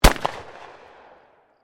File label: SHOTGUN 12 GAUGE ZAFER POINTER UNDEROVER FIRING, Sporting Shotguns